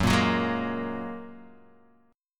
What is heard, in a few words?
F sharp Major Add 9th F sharp, Guitar Open D